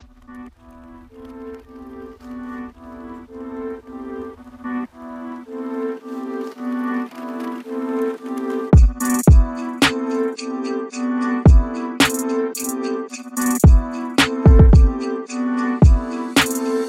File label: Deep Trap; Noiiz; Theos